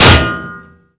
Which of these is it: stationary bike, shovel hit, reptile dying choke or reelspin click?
shovel hit